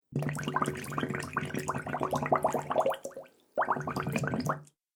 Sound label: Water Bubble Sounds; Water Sound Effects; Agcnf Media